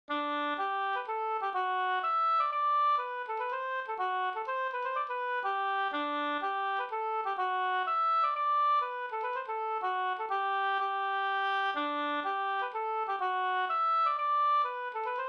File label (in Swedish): hamburska